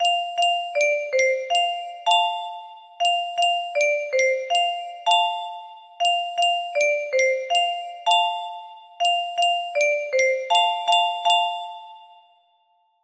slower melody

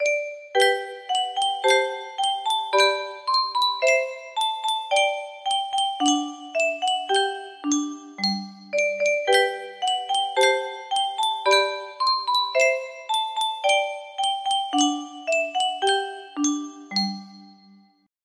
melody, music box

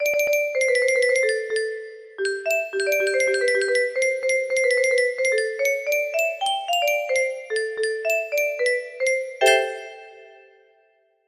music box melody

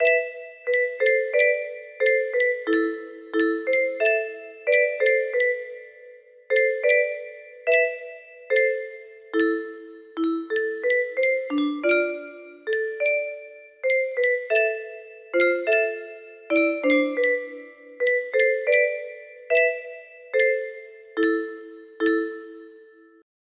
music box melody

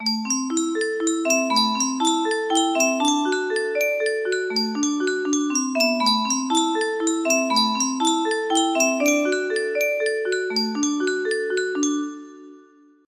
Yunsheng custom tune music box

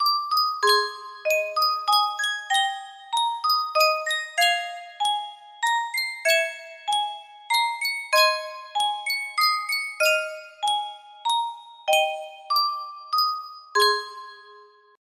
sankyo music box

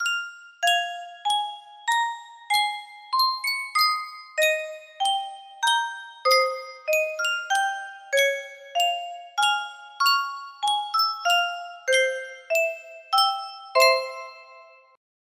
Yunsheng Custom Tune Music Box, music box melody

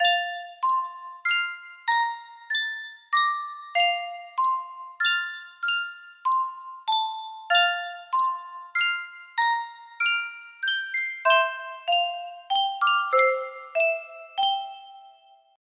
music box; stardew valley

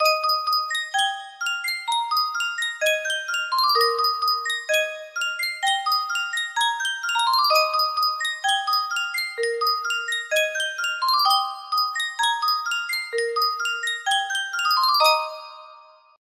yunsheng music box, melody; kingdom hearts

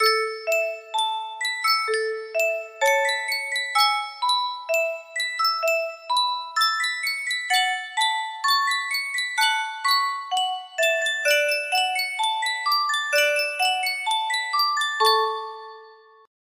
Sankyo music box, melody

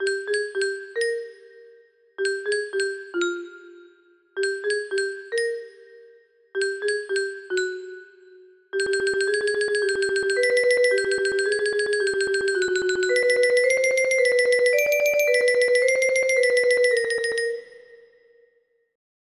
menu theme music box ver; hiperkin

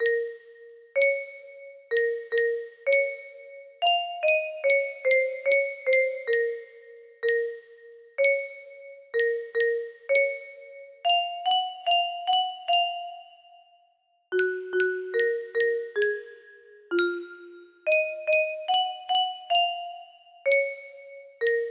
music box melody

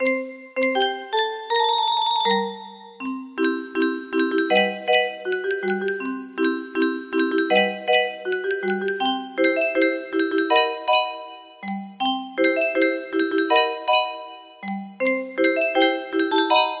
melody

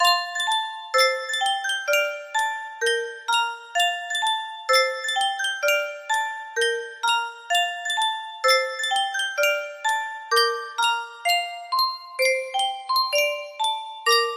Yunsheng music box, music box melody

